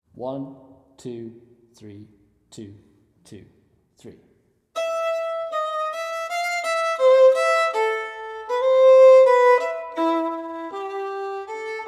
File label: Mazurka Fiddle Steady